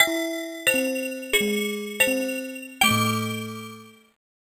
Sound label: more bells